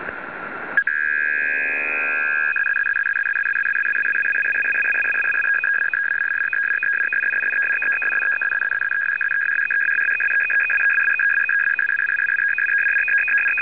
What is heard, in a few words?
USAF 150 Bd PSEUDO#